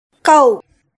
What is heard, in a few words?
Cow